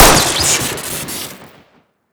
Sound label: sten gun shoot crit; tf2